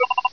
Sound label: grunts